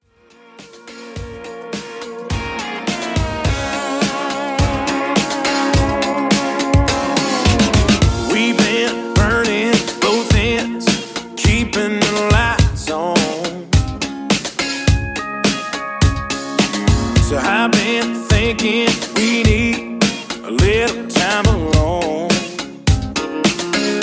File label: Country 105 Bpm; Redrum